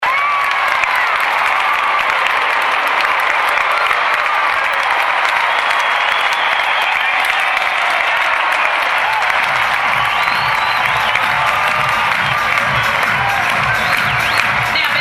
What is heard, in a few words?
Crowd Cheering Ambience